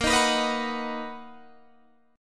B Major A sharp; B/A#; strummed

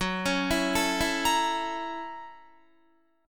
Gb 7th Sharp 9th Gb Guitar; arpeggiated; Gb7#9; 14,16,14,14,16,17; JGuitar

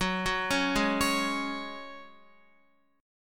F sharp Suspended 2nd, Guitar; arpeggiated

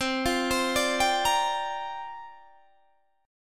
C 7th Suspended 2nd Suspended 4th, Guitar, Open D

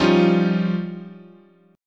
F 7th Suspended 2nd Sharp 5th, chord

